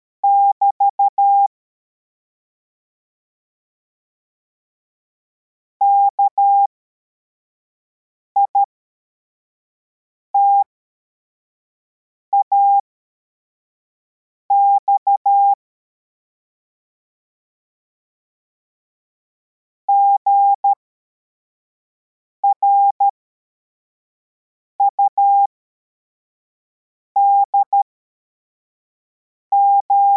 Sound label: cw, morse